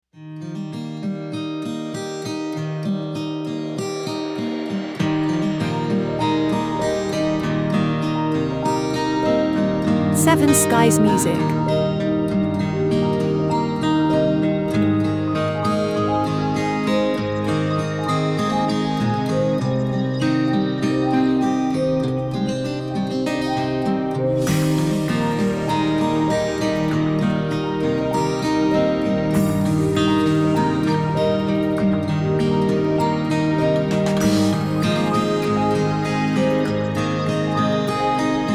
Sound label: Sail Away ambience